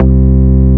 Basses C; Vengeance Samples

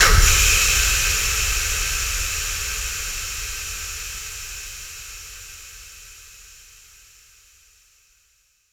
Reverb Athmospheres, FX Vocals; Vengeance Samples

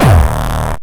Bassdrums Hard Kicks; Vengeance Samples

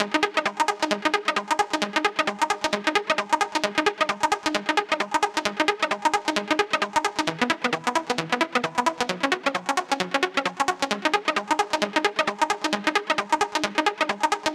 132BPM Shuffle Blipper WET, G# major; Vengeance Samples; Jericho Kit

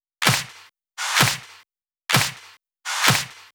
Clap Mix, 128 BPM; Vengeance Samples; VTDS2 Song Kits

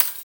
Closed Hihats; Cymbals; Vengeance Samples

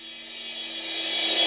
Reverse Crash; Cymbals; Vengeance Samples

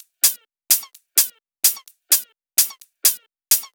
Drumloops 128BPM

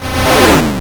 Arcade Riffs

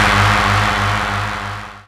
Synths G#; vengeance essential club sounds-3

